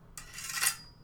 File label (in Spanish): Levantamiento espada